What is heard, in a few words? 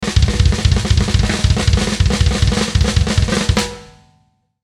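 Musical Element, Drum Snare March; Blastwave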